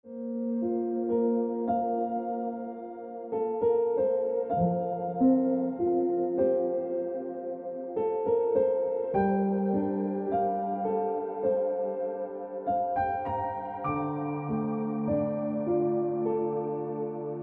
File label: Piano Music